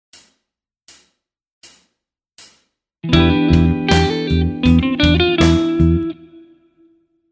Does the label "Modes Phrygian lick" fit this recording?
Yes